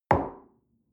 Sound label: coffee place table soft short, restaurant cafe